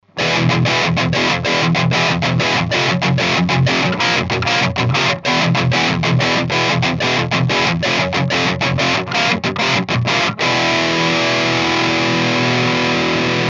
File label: Distortion Bridge Humbucker